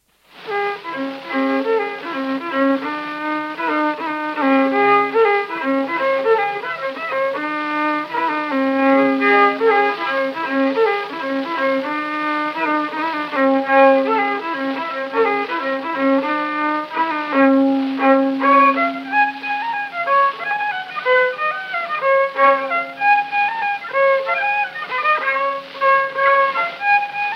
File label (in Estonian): Kuusalu viiul folk; Ranna radi; Gustav Lindstrom